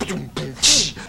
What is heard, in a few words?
nosy, Beatbox, Drum loops